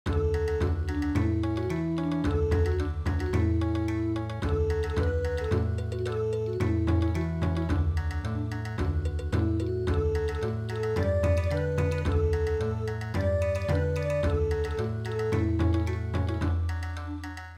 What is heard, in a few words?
Bon Odori, traditional